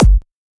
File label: Dr Kick